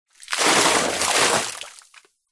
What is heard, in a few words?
nature water pour medium amount deep sudden fast